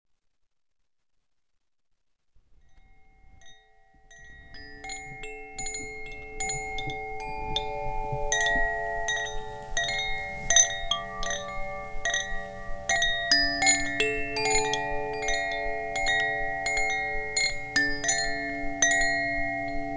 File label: Koshi Water